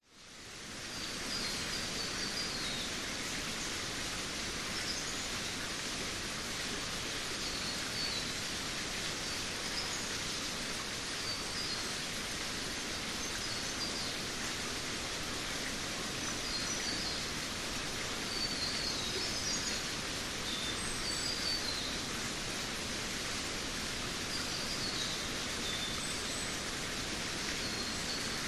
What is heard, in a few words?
nature rainforest creek to left birds wind trees qld australia